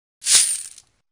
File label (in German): GELDBEUTEL